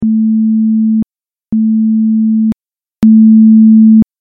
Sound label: speaker test